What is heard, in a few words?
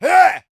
warrior attack swing